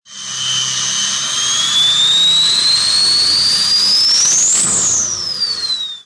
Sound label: turbo whistle powerstroke